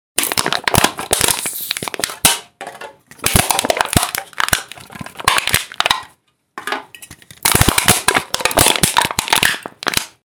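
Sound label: jars sound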